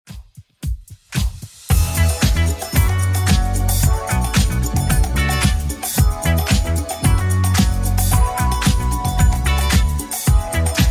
80s Redrum 112 bpm CLEAN

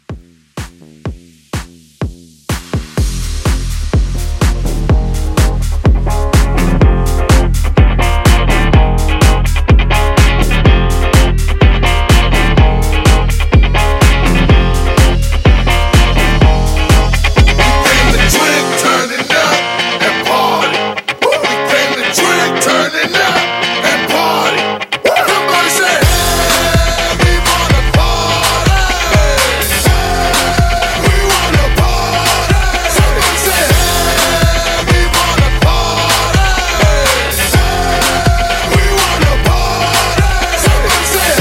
Hybrid Bootleg

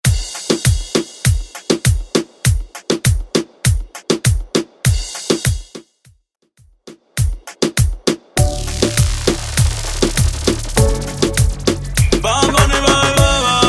Moombahton Quick Mix 100 Bpm Clean

